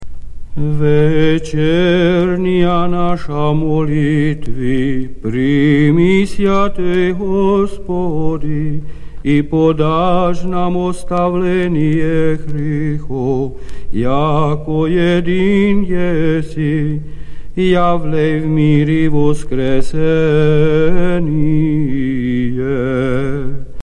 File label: Tone 1 Stichera; Papp Irmologion